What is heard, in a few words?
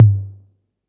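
808 Tom LOW; Concrete Collage Drum Kit; Concrete-808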